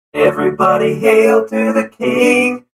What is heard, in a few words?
alert; nadeking